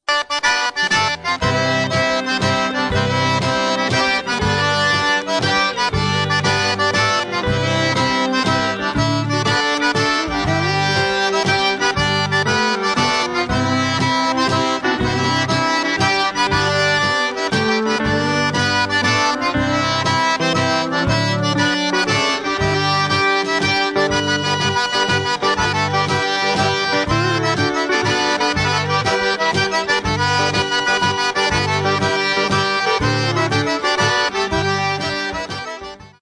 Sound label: Cajun Waltz